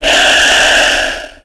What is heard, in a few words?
big hiss; ghouls forest2; bigzombo